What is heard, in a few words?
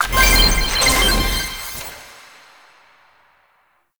Peely Pix Sticker Reveal; Warframe; Nineteeen Ninety Nine; Deep Archimedia